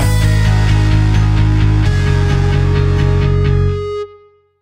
Nokia Chord

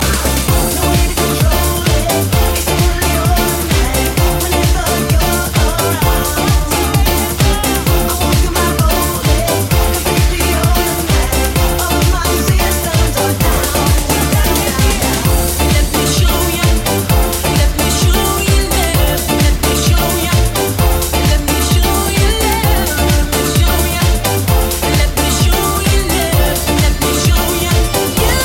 Dancemusic

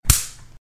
Tight Punch

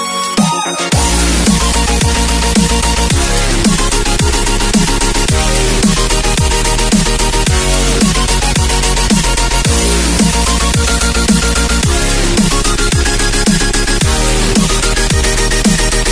Dubstep

